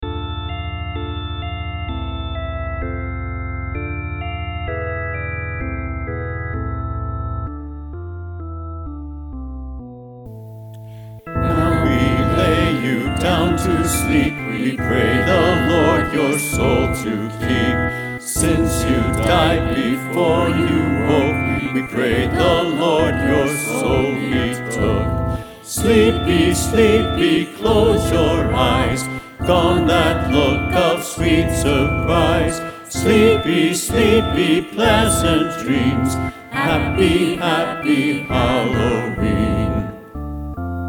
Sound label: Funeral Hymn